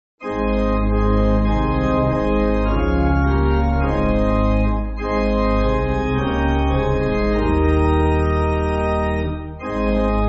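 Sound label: Pipe Organ, Music Backing Files for Churches; Follower Of Christ; Groos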